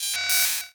Science Fiction Sci-Fi Electronic Computer terminal